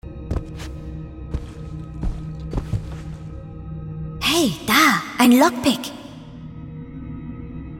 lockpick

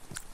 communication sound inventory open

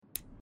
closeing game menu sound; Life is Strange 2; gamesoundopedia

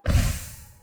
double jump; hollow knight

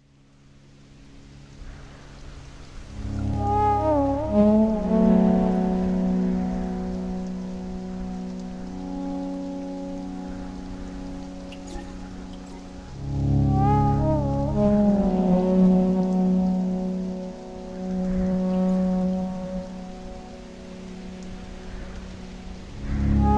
soundtrack ambience south; hyper light drifter

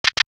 reload; Duck Game

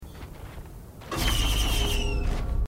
health shrine; risk of rain 2

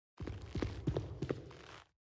footsteps hall; baldursgate

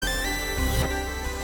celeste sound respawn

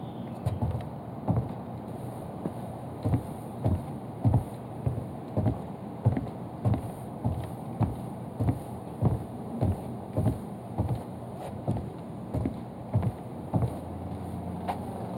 steps

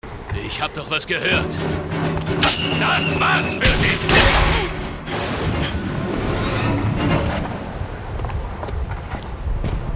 fight

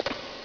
step; Metal Gear Solid; gamesoundopedia

